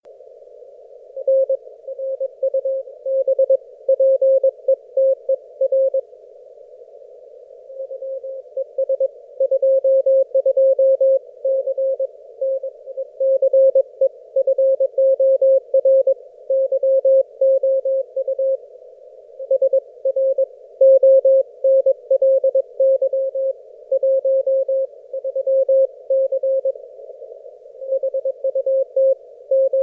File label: 30m agc off; vk3io